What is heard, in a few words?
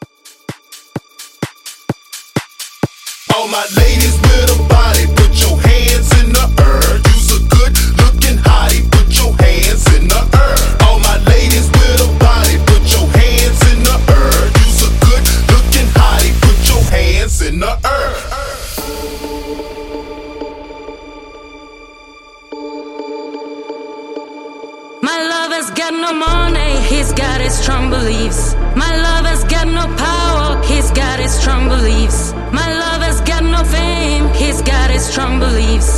House Mixshow Edit 128